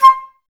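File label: flute, Woodwinds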